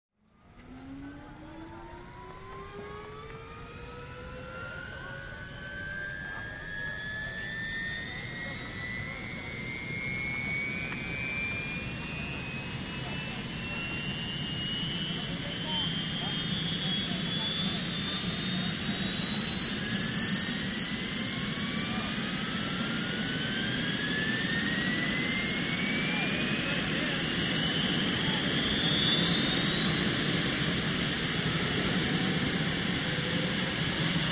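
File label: Helicopter Start Idle; heli-fx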